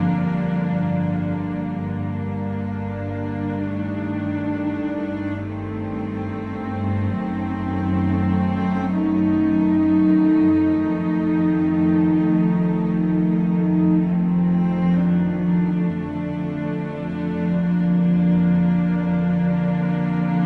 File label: strings, Sad Loops